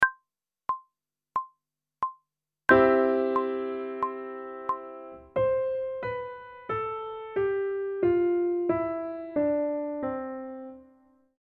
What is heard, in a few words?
Major Scale one octave descending, pop vocals; Grade 1; Technical Work